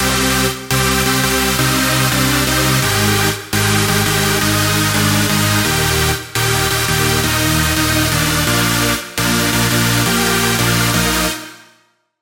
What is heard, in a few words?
chord; Rise Of Mortal; Routed Sound; Serum 2 Expansion - Flame Summoner Vol.1